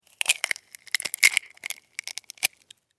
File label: Larva, Egg, Hatch, Crunchy, Birth, Insect, Bug; Blastwave; Animals:Insects